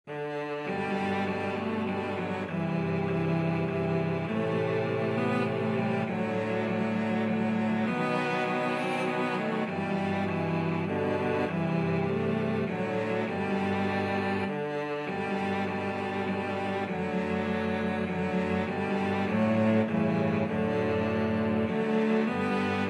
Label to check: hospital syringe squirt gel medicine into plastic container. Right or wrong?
Wrong